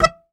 Very Short Note, Melodica

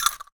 Cowbell Chain, Percussion

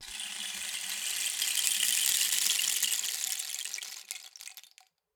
Rain Stick, Percussion Samples

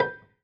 Prepared Piano

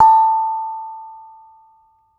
Bonang, Gamelan

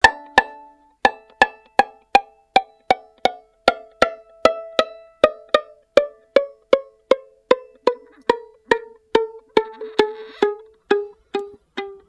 Violin Extended Technique

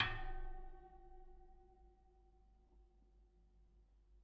Bicycle Spoke

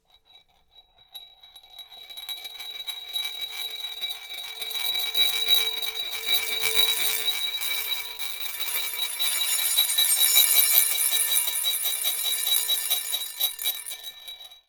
Marble Spin, Large Wine Glass

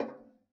Ping, Kitchen Toaster

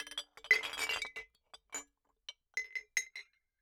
Bottle Struck

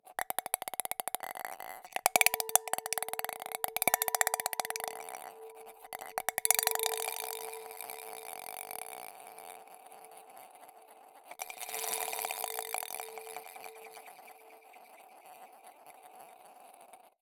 Ping Pong Rattle, Large Wine Glass

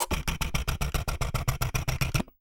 Camera Sequence

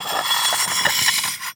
Hole Saw Scrape; Hole Saw Bits

